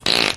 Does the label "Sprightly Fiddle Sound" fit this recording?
No